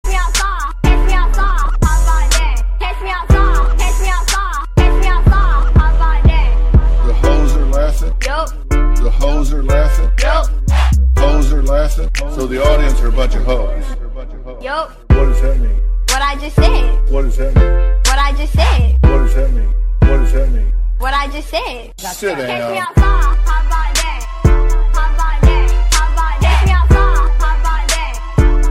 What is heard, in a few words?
trap ringtone